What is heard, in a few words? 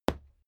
fight impact sweetener tiny